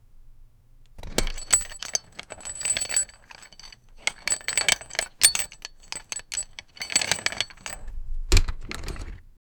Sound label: light armor like clnk clnk, buckles